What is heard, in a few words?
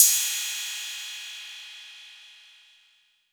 Roland 808 CY